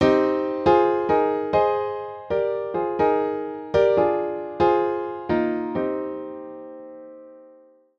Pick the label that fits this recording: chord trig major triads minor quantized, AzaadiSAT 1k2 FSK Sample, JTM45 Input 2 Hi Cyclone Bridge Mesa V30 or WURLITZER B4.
chord trig major triads minor quantized